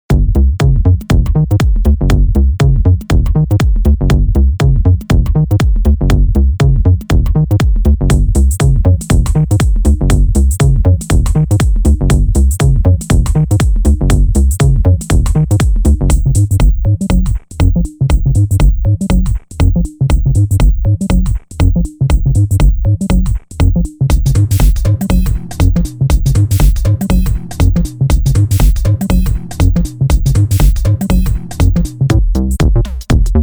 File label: Minimal Bass Loops